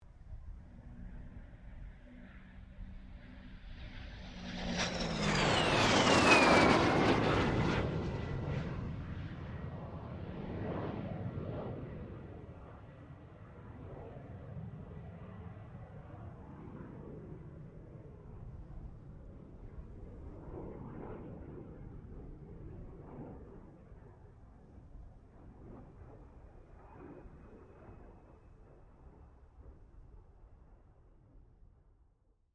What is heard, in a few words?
AIRCRAFT DH-115 VAMPIRE T.35 PASS, Vampire Fighter Jet; 1946; Airplanes; Vehicles